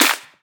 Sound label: Clap; Vengeance Samples